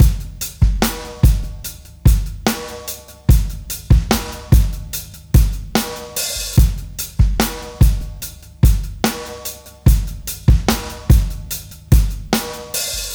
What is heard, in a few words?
73 live rock drums